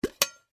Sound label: Coin Cup Cap Open, Foley, Coin, Cup, Cap, Open; Money Sound Effects; BlastWave